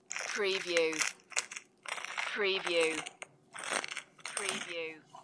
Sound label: Pepper Grinder